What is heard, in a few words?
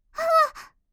default beep